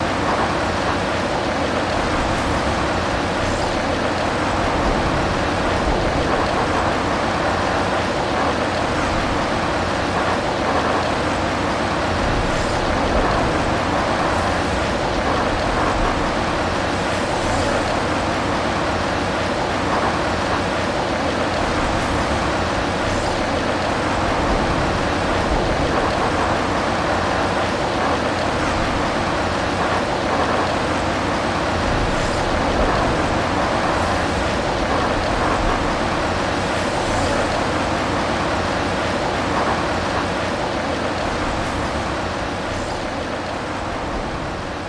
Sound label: ambient cyberpunk bustle